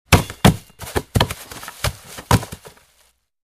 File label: Football Fumbles